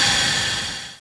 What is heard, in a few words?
cannonex dragon ice end; zmall